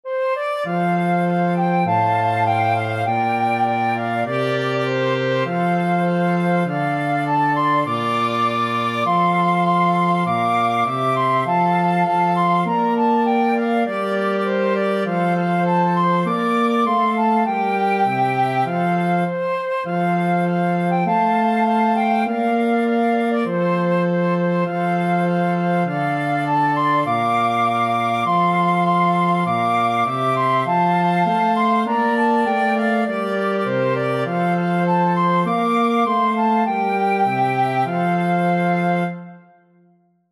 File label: flexible mixed ensemble, 4 players